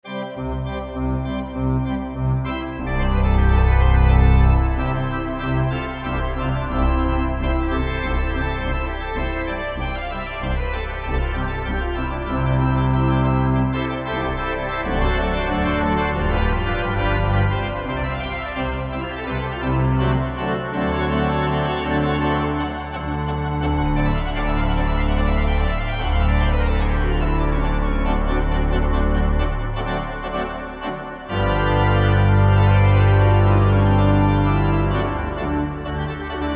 Free impro, Free improvisation insp. by P Cochereau, organist of Notre Dame Paris; Organteq; Pianoteq; Modartt